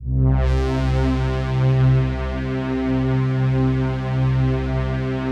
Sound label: PAD, Pads; Best Service; XX Large